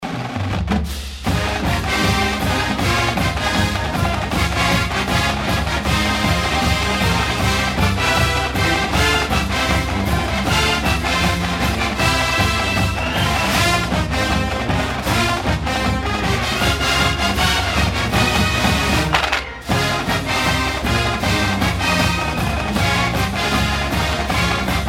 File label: fight song